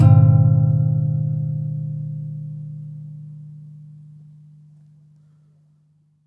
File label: Classical Guitar Marimba Hit, Classical Guitar Extended Technique